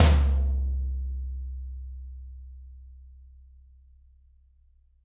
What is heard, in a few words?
Toms, Drums; Bob Clearmountain Drums I